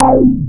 Anasound Short mid C, Industrial Analog Single Notes; Sonic Foundry (Sony Creative Software)